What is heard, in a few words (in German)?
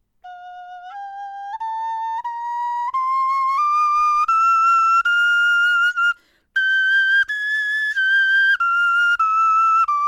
tonleiter, knochenfloeten